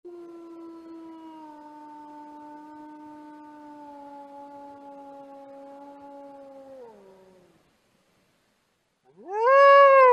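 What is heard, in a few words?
one wolf echoes another distant one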